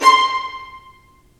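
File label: violins marcato, String Sections; Roland LCDP13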